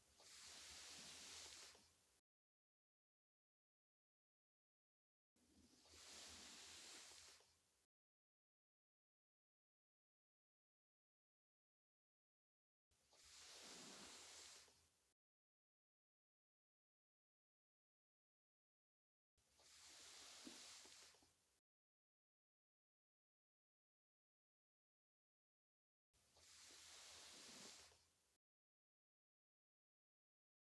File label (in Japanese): ASMR（尻尾 弱）